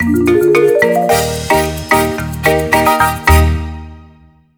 Bonus; My Exotic Farm

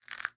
Cookie; miside demo windows gamerip 2023

